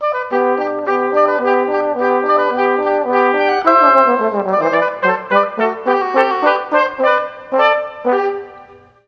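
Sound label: Tbn Ob Trp, trombone oboe trumpet; Juilliard Music Adventure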